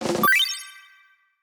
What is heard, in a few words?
ui win settlement